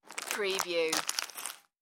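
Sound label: Handle Food Package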